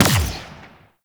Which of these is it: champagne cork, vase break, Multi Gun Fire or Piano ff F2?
Multi Gun Fire